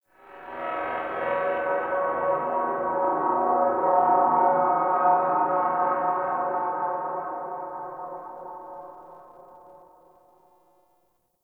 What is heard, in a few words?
Distant Sentient Drone; Warframe; Tau